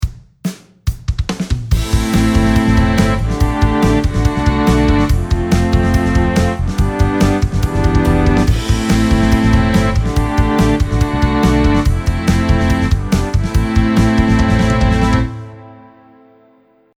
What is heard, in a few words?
delay